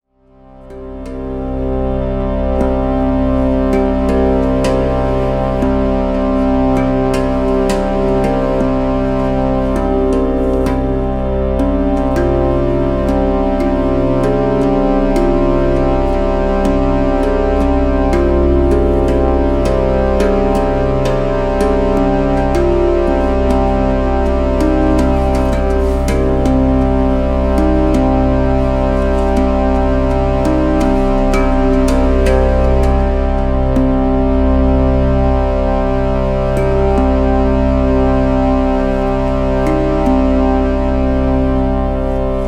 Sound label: Shruti Box Journey